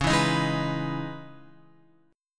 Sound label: C sharp Minor 9th C sharp Guitar; C#m9 strummed; x,4,4,8,8,7; JGuitar